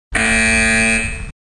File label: buzzer Sound